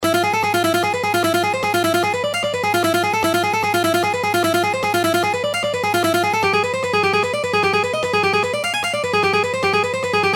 Guitar Dynamics

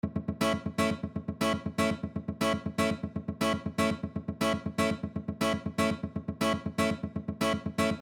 Rhythm, guitar

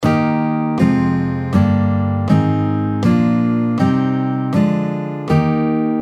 D Major Chord Scale

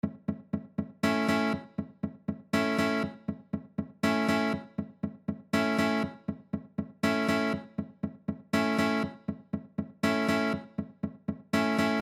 Rhythm, guitar

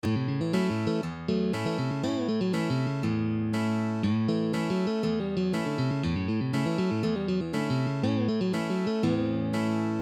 Country Licks Country Bending Solo, guitar; Arlen Roth

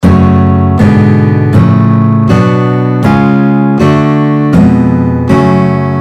F Sharp harmonic minor chord scale, guitar

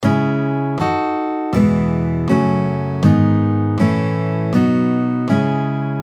C minor Chord Scale

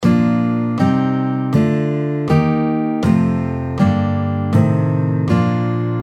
A Major Chord Scale, guitar

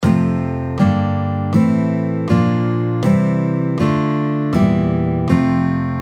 F Major Chord Scale, guitar

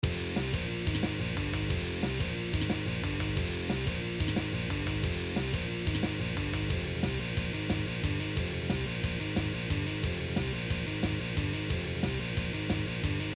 easy metal riffs, guitar

